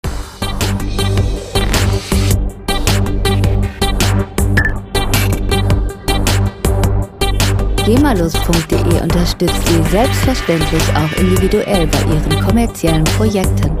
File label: hyperbass